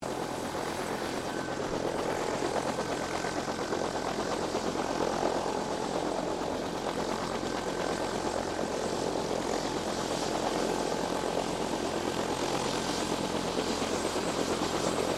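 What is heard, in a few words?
helicopter Bell UH1 Iroquois idle distant, stereo; RAN UH-1 Iroguios; Helicopters (Sound Effects:Vehicles)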